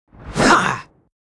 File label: Paladin M Attack